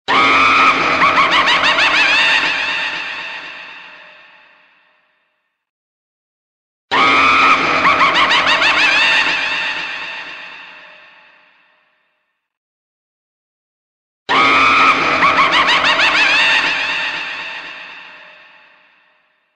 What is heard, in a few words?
Scary Laugh Sound